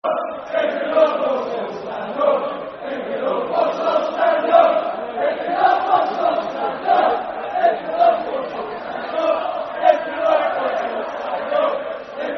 Stand Up If You Love Walsall, Football Chant (Soccer Song)